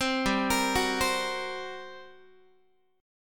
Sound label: G Minor Major 7th Double Flat 5th, G, Guitar; GmM7bb5; arpeggiated; 15,15,13,16,16,x